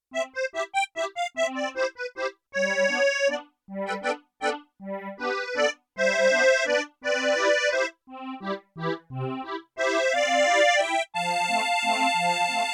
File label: Musette dual, Yamaha TX16W; Combo Performance 2